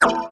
fail

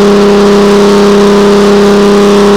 engines

